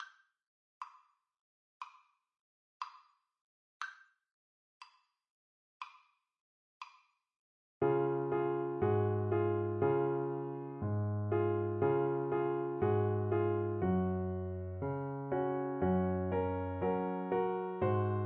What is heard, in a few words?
jingle bells, Saxophone